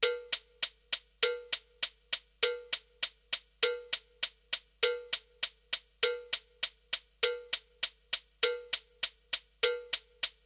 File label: main beats slow